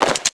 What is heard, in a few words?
Pickup ammo full; level items; Max Payne